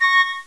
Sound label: pipe